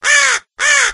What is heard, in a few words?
idle, crow; monsters